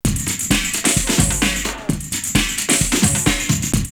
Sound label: drumloops, Techno House, 130; Tim Balls; X-Static Goldmine